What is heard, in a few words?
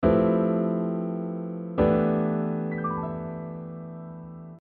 Fmaj#11 to Em7 add4